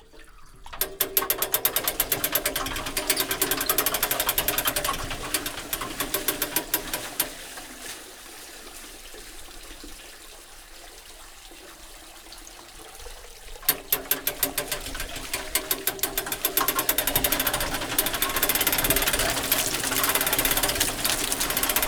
kétszer szellő, kanalas kút

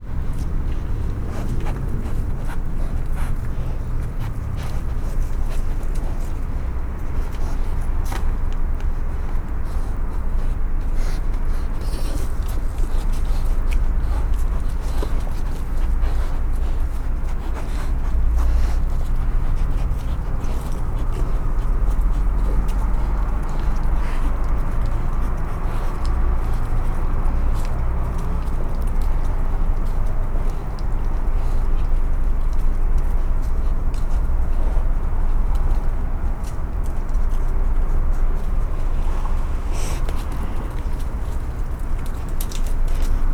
szimatolnak, foltos hiéna

